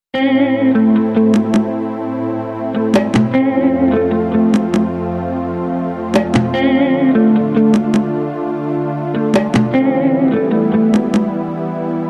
3d cool tone

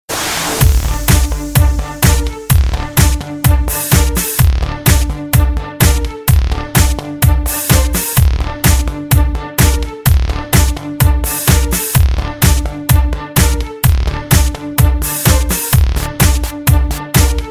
techno violin

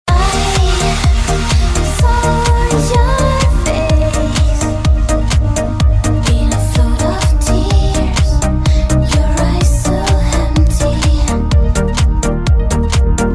aqua melody

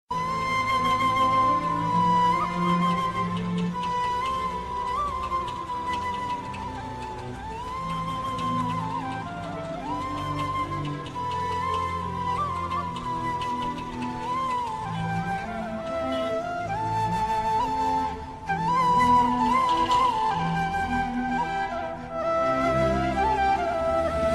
superb flute

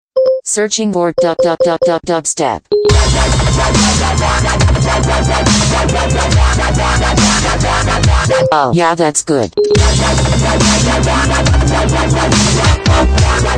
dubstep; siri

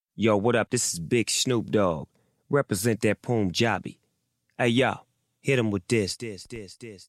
punjabi

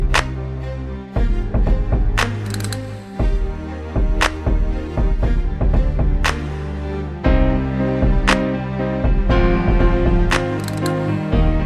tune

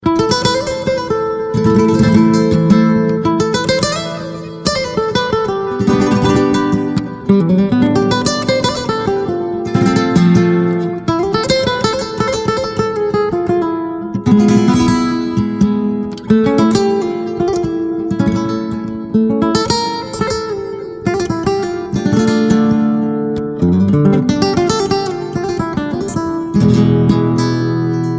best guitar tone

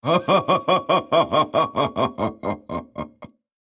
laugh; league of legends; zed

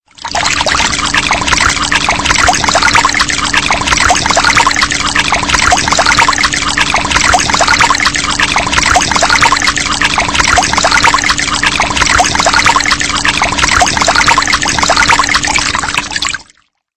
flowing water

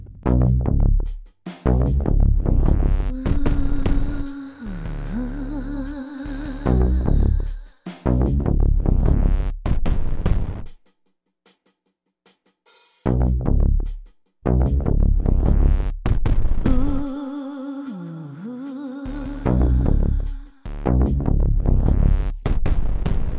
rock on

